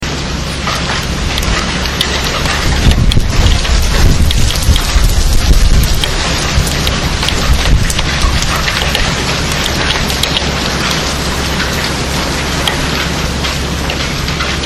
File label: the sound of walnut sized hail in calgary beating up a garden hail; yyc